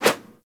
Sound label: Throwing Dagger Idle Catch Whoosh; Warframe; Weapons